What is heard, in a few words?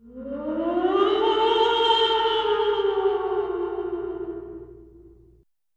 moan